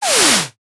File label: transition page flip